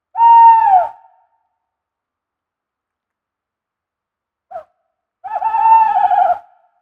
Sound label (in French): Chouette